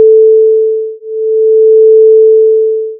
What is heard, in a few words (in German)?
schwebung, akustik, ueberlagerung; physik